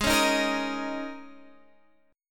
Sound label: G sharp Suspended 2nd Suspended 4th, G sharp, down, Guitar, Standard; G#sus2sus4; strummed; JGuitar